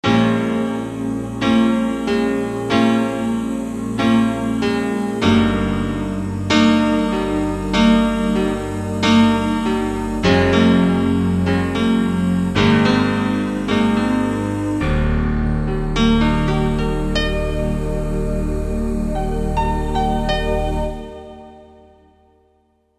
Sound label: Air Piano Ensemble; Korg TR-Rack